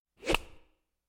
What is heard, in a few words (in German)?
golfschlag; Sport